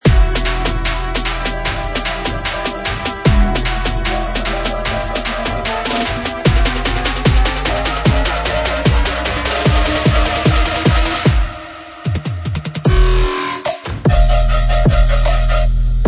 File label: Trap Future Bass; Spinnin Sounds